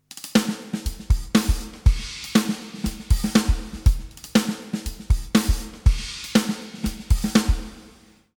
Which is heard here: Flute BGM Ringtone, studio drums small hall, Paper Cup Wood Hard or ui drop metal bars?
studio drums small hall